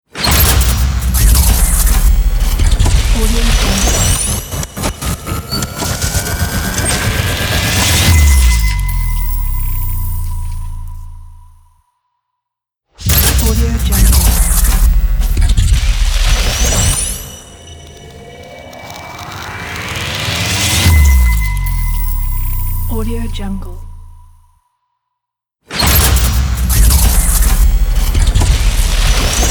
transforming hightech logo

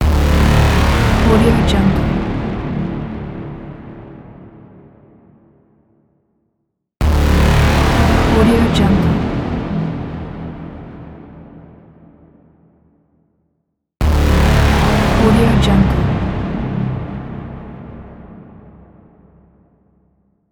cinematic trailer mega horn

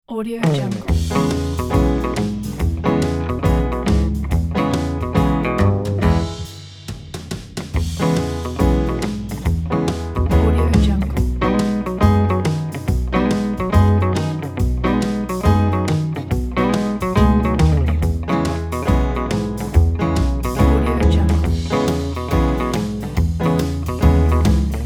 swing dancing

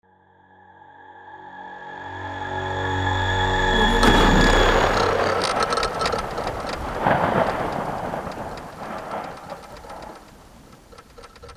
battle futuristic horn

